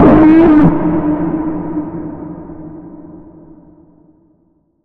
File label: Bend, D sharp